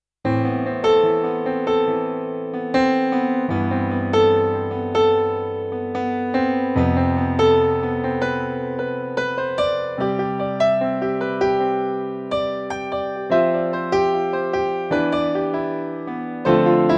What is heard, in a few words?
Studio Grand